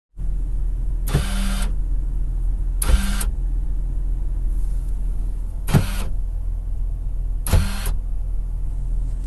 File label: only rear liftgate power door lock functioning xj locking